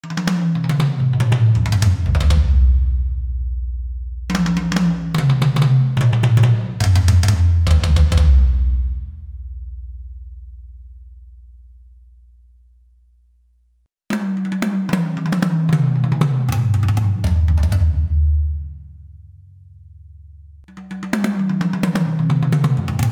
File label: tom kits; Studio X